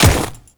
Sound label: Ice Step, Footsteps; Warframe; Grineer Royal Guard